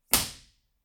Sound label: impulse response; Corso acustica